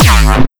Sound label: Kick Dist Hot Day; Granular Sumo